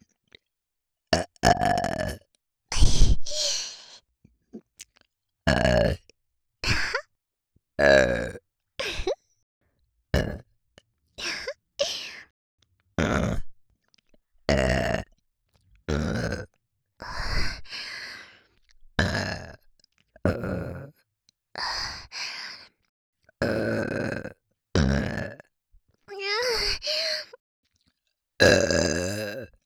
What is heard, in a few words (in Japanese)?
ゲップのみ